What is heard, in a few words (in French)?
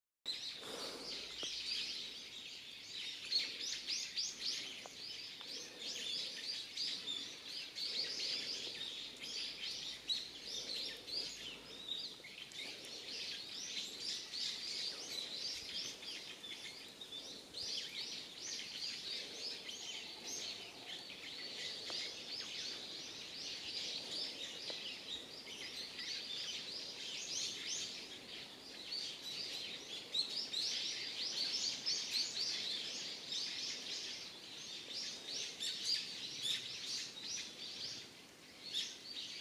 Unzen Oiseaux; Sounds of Unzen